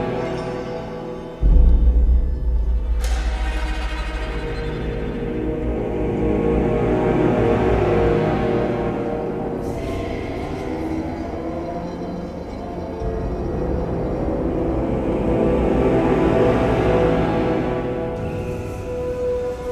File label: dialog ominous; Iron Blade Medieval Legends